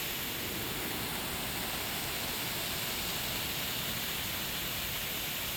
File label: thruster; outfly